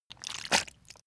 intestines slip

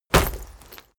leather armor hit

